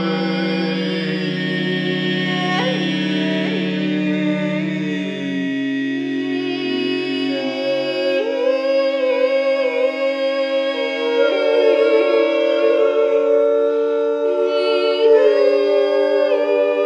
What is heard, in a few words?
Fffffhhhhh